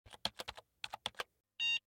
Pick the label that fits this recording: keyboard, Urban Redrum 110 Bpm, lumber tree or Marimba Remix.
keyboard